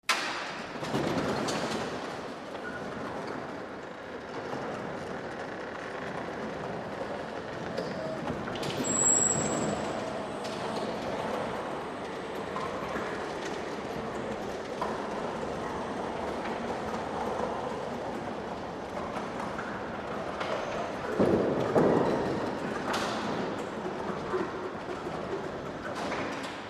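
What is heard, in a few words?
Electric Roll-up Gymnasium Doors open close, Medium Distant.; Metal Gate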